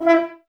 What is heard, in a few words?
E, Brass, F.Horns, Woodwind Brass Ensemble; Sample CDs; Miroslav Vitous